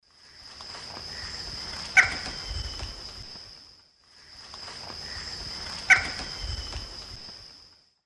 Dromococcyx phasianellus, alarm call; S. America; Eastern Lowlands

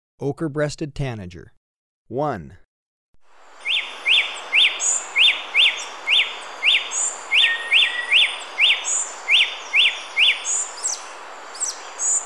Ochre-breasted Tanager, Birds of Ecuador; S. America

